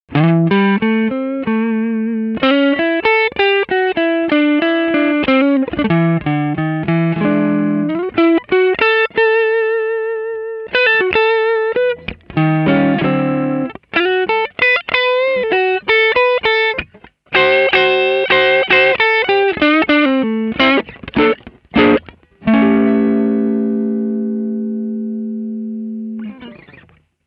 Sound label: 2 Neck, guitars